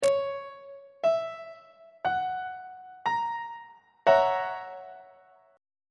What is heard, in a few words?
g flat dominant 7th 2nd inversion on treble clef